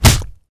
punch; Mount&Blade Warband

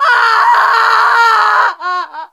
horrified, witch voice retreat; npc; Left 4 Dead 2

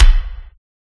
kick; Tank

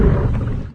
jetski off; vehicles; Garry's Mod; hl2

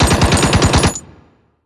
infantry fire mg standing; Hearts of Iron IV Death or Dishonor

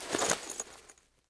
jump; AssaultCube

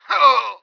normal dying; Emperor - Battle for Dune

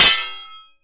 hit metal metal; Mount&Blade Warband

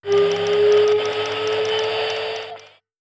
high alert; Hearts of Iron IV Death or Dishonor

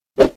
sword Swing; Mount&Blade Warband

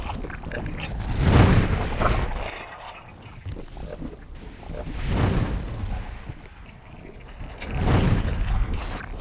lava bubble; GrandFantasia

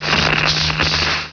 REPAIR VEHICLE SPARKS; Emperor - Battle for Dune

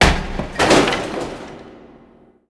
wood debris; Left 4 Dead 2

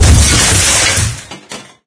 gib, manhack; Garry's Mod; hl2

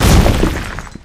boulder impact hard, concrete; physics; Garry's Mod; hl2

